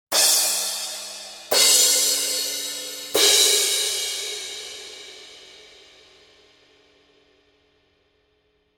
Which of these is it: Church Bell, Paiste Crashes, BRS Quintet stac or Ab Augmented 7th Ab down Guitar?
Paiste Crashes